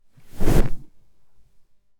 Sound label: Pillow Flop